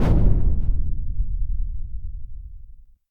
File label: explosion; Mindustry